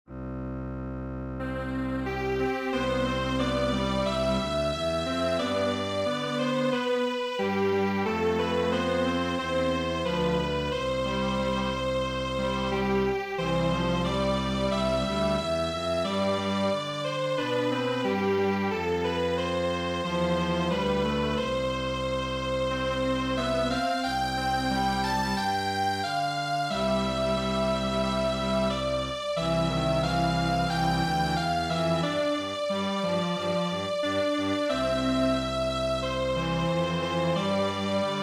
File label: Strings Quartet, traditional